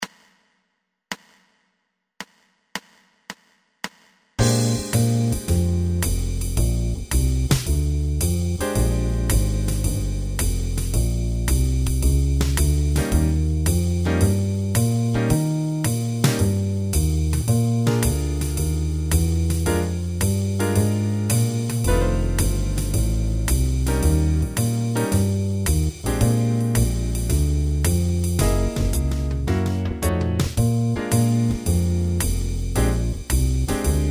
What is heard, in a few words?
swing no melody